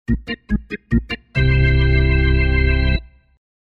dlja bejsbola

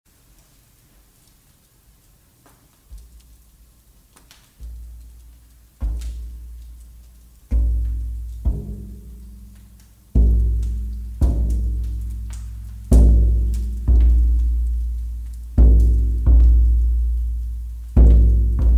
shamanskij buben i koster

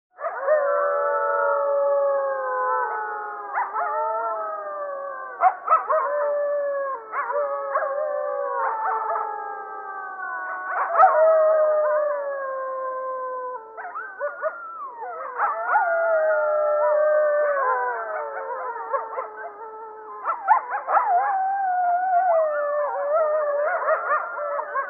voj stai volchej

